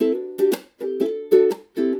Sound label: funky